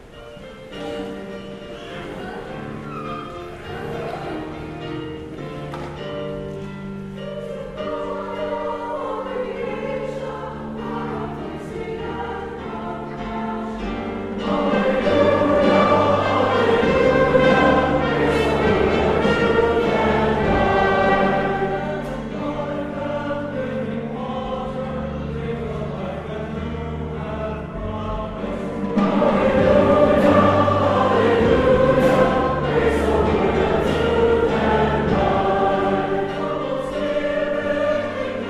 Gospel Acclamation

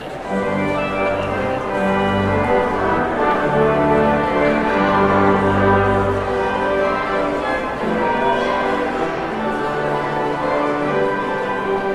Postlude

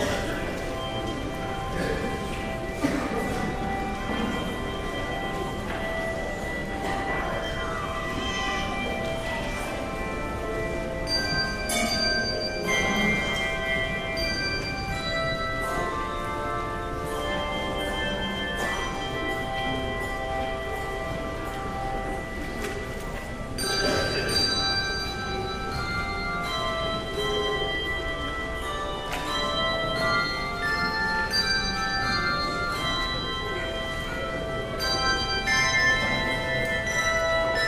Handbells; It Is Well; Behnke

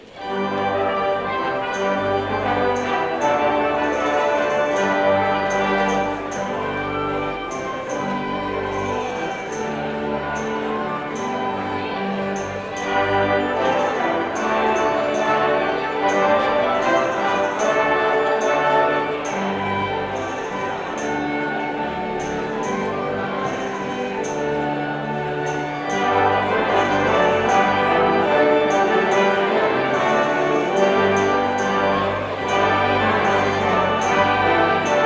Postlude Gavotte; Praetorius

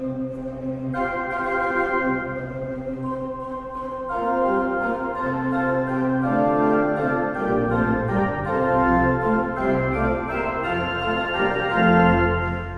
Easter Strings and Winds; Maundy Thursday

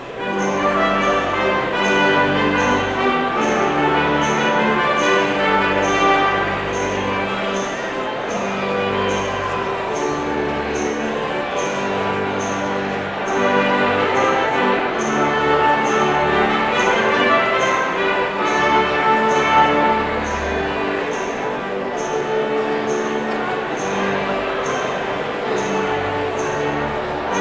Postlude

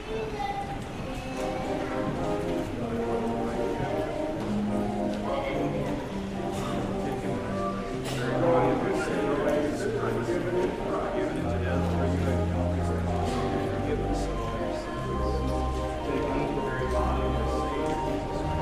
Organ Interlude; O Love How Deep

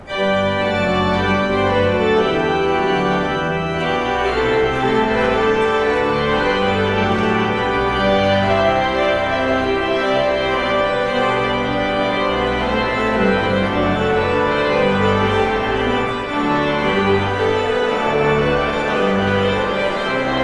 Trumpet Tune

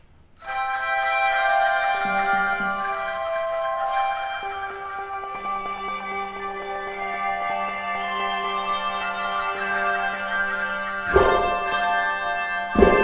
Handbells

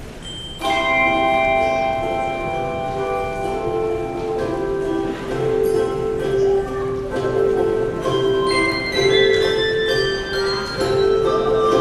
Handbells; Now All the Vault of Heaven Resounds

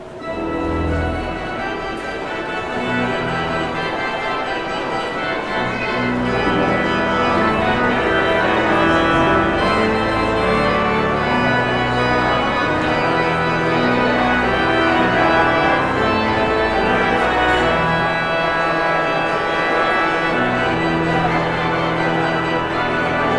Postlude

